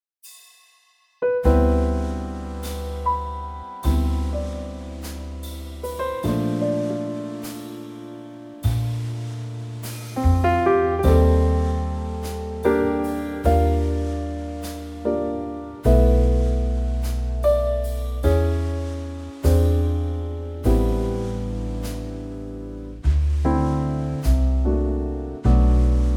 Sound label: G trio